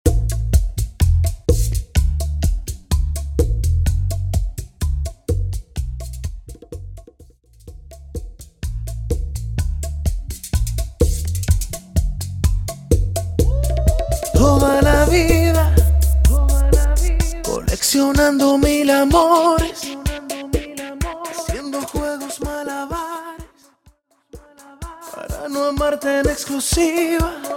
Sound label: Percapella Intro Outro 126 BPM